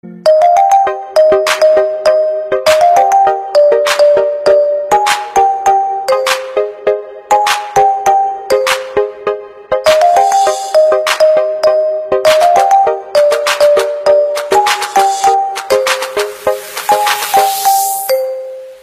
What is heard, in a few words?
Ringtone Mobile